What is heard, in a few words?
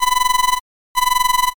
black phone